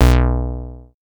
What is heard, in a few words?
Synth Bass; Vengeance Samples; VEE Synth Shots